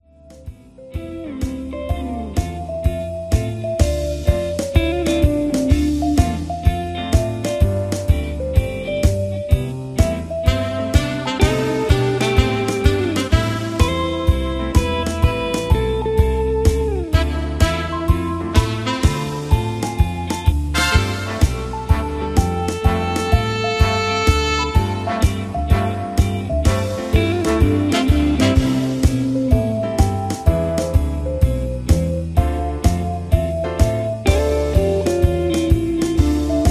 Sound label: Instrumental with Leads; Singing Calls; Big Band